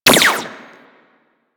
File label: Burst Laser Pistol Fire; DE; Warframe; Weapons; Sentinel